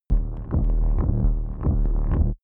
sci-fi alien enemy

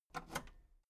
metal lever button radio enable medium

